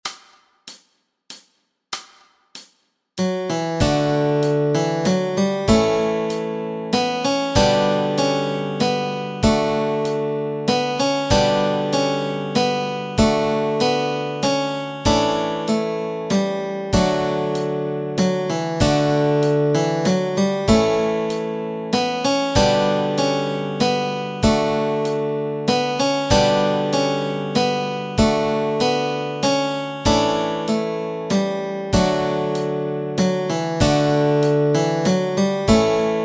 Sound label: First Noel melody and chords